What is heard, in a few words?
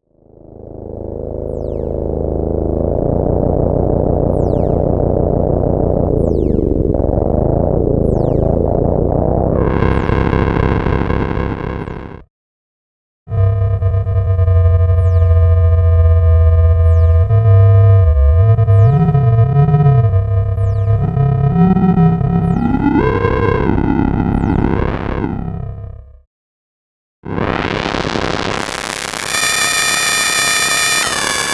Moog Modular